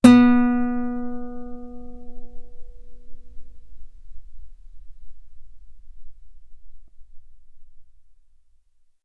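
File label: Guitar ff B string B3; sul B; OmniPower4296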